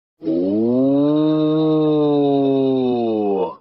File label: ooooohhh